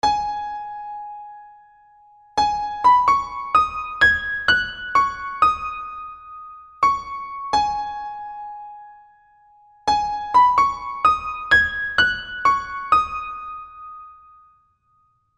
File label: Opening Piano; fan made